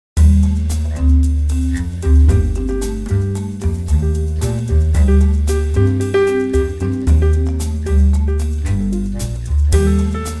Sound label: Shifting sands solo piano; fade